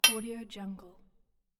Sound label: small metal hit